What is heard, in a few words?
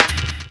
Feel Break